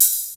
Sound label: HIHAT; SoundFactory; SMPMANIA